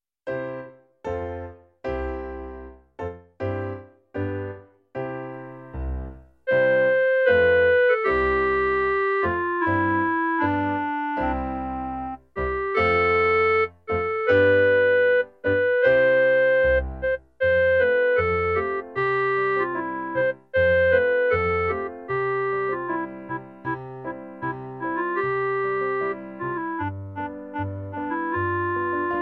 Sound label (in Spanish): CLARINETE; Joy to the world